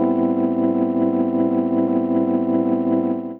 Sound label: DC Rhodes Chord; voxandchords